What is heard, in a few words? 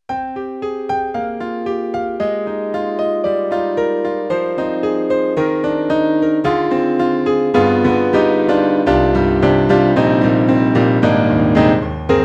YAMAHA XG SoftSynthesizer, Grand Piano; WDM